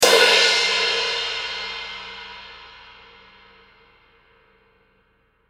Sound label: Drum Cymbal, Cymbal Sabian Aax 16 Inch Stage Crash Single Hit; Musical; Blastwave